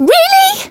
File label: damaged; lele